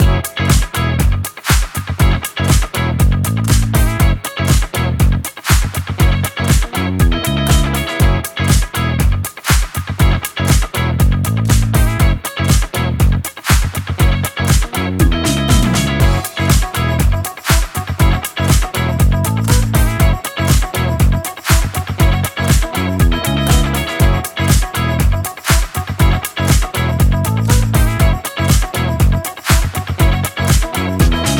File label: Disco Funk; background music